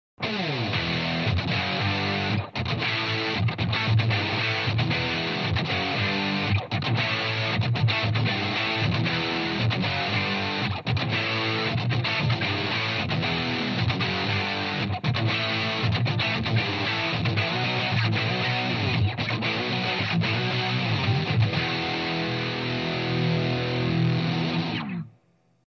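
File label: guitar; going good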